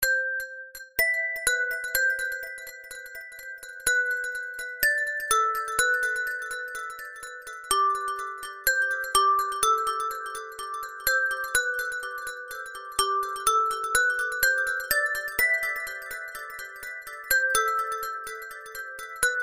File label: ACOUSTIC VERSION